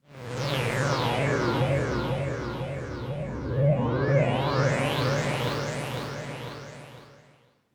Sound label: Bm Fx One Shot; 12 Bit Soul; DrkProphet16